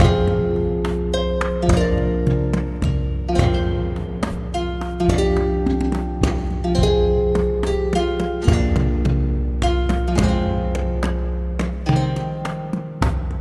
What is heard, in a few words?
Tavern, Looping; Dungeons & Dragons